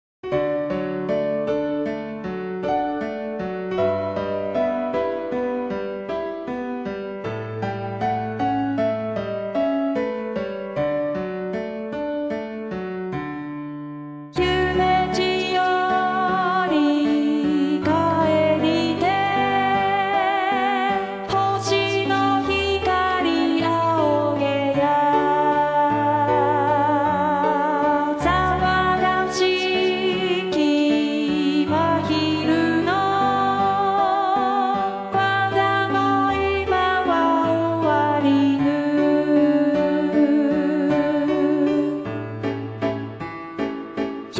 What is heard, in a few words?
chor